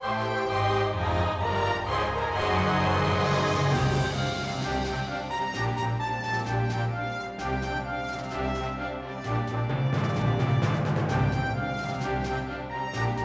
Victory Fanfare; Final Fantasy XII Version; Final Fantasy [PS1 + PS2]

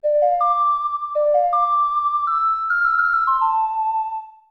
Ocarina; Nintendo 64; Legend of Zelda, The - Ocarina of Time

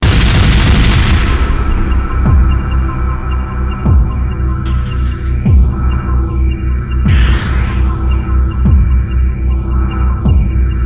Game Over; TimeSplitters Trilogy; TimeSplitters 3 : Future Perfect

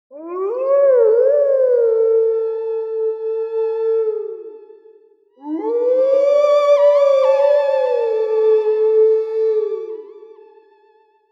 Hawk Grass (Howl); Legend of Zelda, The : Twilight Princess

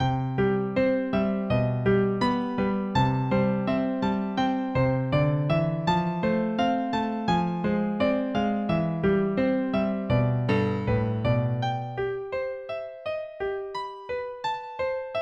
Piano Practice Music; Legend of Zelda, The : Majora's Mask

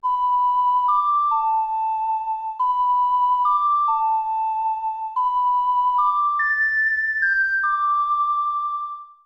Ocarina; Nintendo 64; Legend of Zelda, The - Ocarina of Time

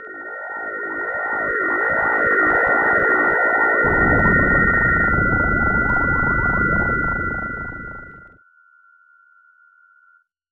Teleportation; Super Nintendo; EarthBound

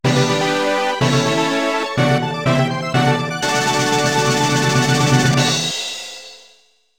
Battle Race Goal; SnowBoard Kids

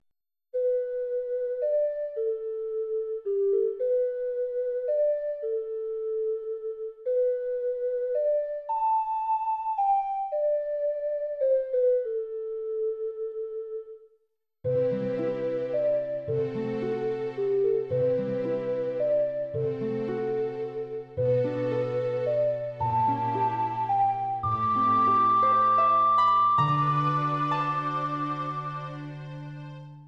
Ocarina; Zelda - Ocarina of Time; Zelda's Ocarina

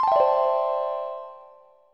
SFX Brentilda Dissappears; Banjo Kazooie

